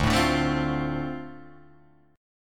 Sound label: D-Minor 7th D Guitar Open G, chord